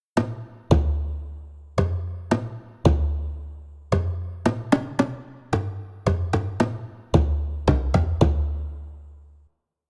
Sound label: Drums